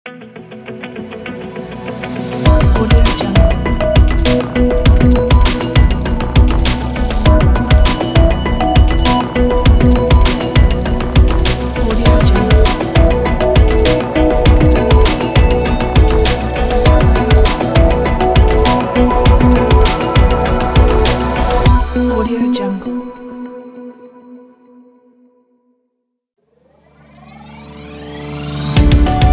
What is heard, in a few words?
news logo ident pack